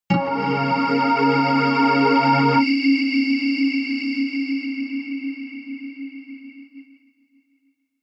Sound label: synth hit steam high; whooshes rises